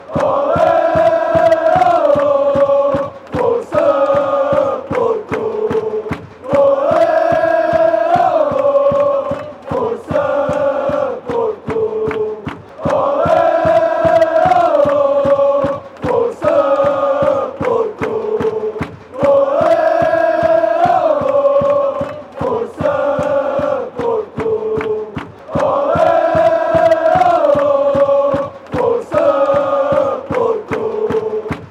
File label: allez aye oh forca porto, fanchants